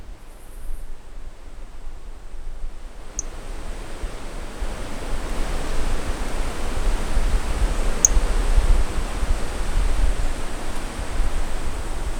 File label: Brachycephalus mirissimus individual 150 cm two initial notes missing